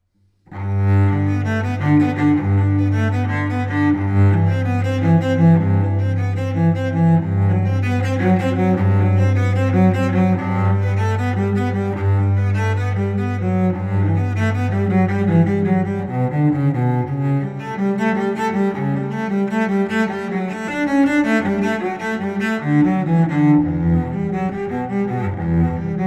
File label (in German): Prelude G Dur Suite, Cello; Bach